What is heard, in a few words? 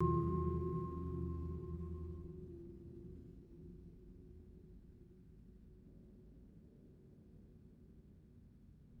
deep muted bell strike and sustained hum